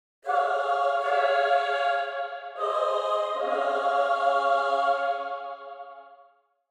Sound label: Angelic choir angels; guided by light